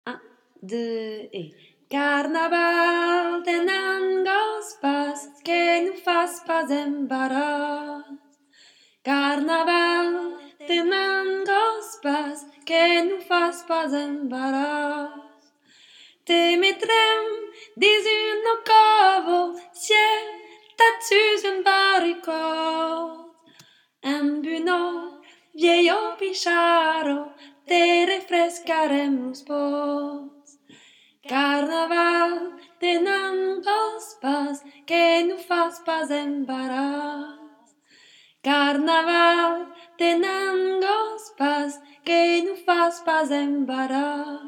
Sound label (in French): Voix